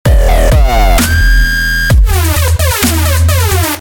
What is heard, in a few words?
dub step sample